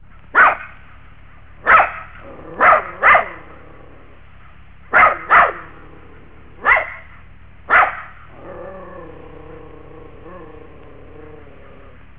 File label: dog small